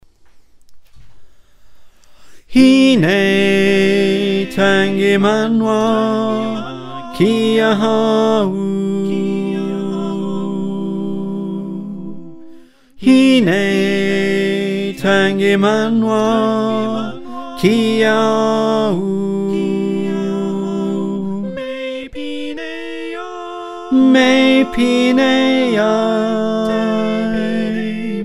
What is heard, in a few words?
Tenor